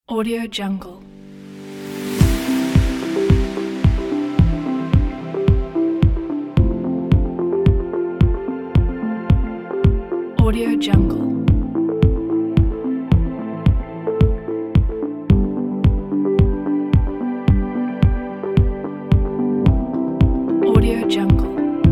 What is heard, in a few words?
upbeat ambient